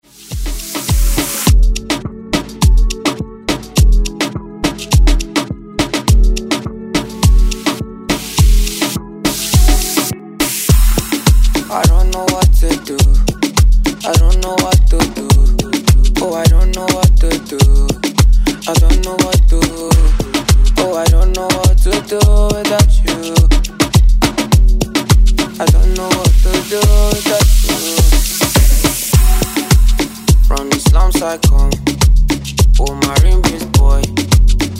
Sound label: Afro Moombah; Redrum